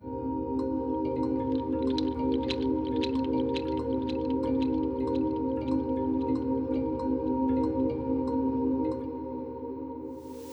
Ethereal, Pads; BB 2HTC Samples Vol 4 Addendum

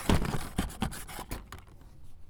Pushing Plastic; BB Retail Therapy Sample Pack

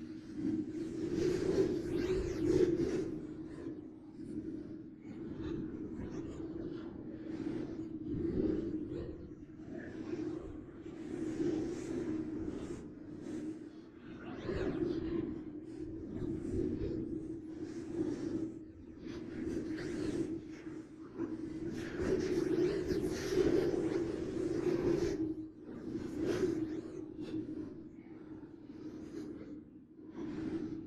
sci-fi sfx

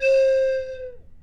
Quick Low, slide whistle

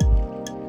Thickus Kickus, Drums; BB 2HTC Samples Vol 4

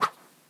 Swish, organic wooshes; Micro Pack

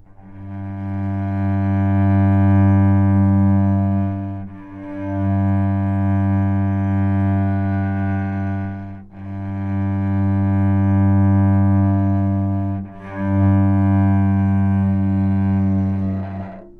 G2 Sustain, Novice Cello

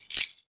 keys, wood metal; wood metal SFX

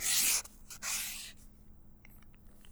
Thicc Tip Lines, writing; bb - Books, Paper, Writing

